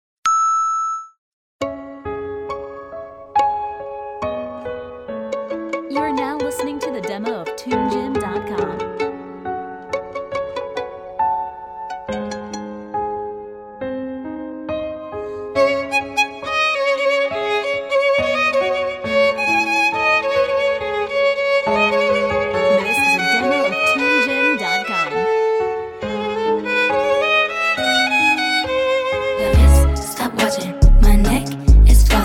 Violin Cover

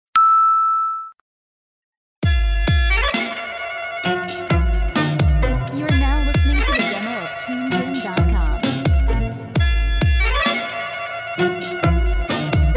Tango

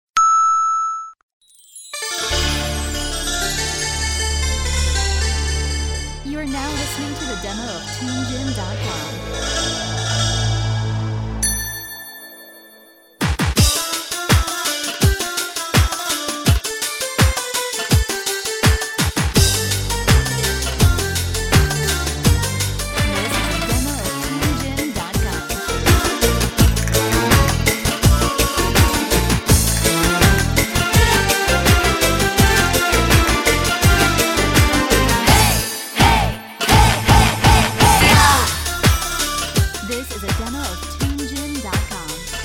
Flamenco

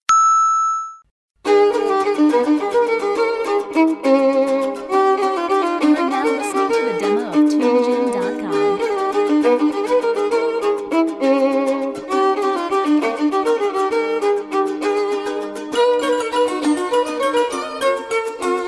Walz

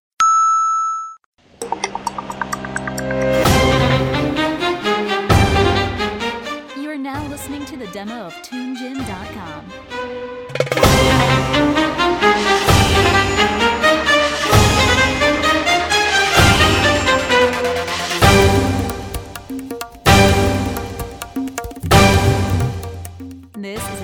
Arabian Dance; Tunegym

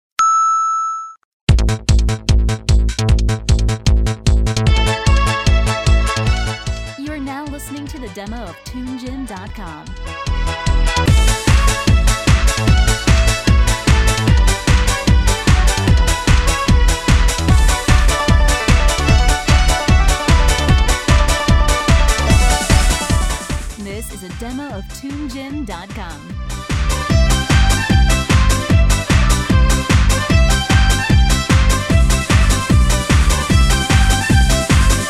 Country

